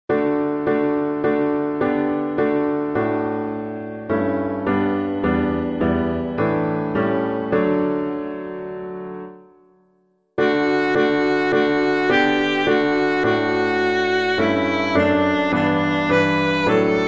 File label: God Is My Strong Salvation, piano